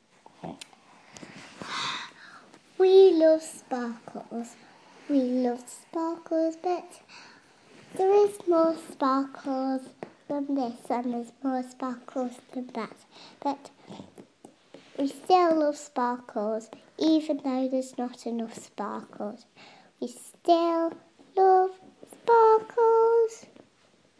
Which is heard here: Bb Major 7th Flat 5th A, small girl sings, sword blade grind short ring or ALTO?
small girl sings